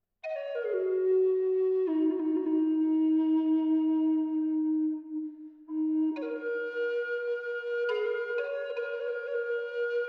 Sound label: Bubinga E